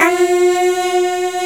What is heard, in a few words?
UMM F#3, POP CHORUS